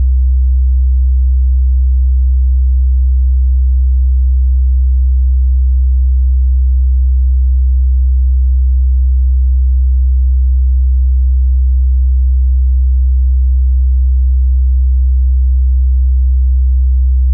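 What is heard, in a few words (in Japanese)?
64 Hz, 信号音; 3 オクターブ上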